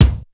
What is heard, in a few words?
BD HUMP; drum; BASSDRUMS